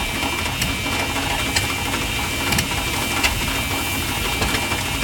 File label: engine med; War Thunder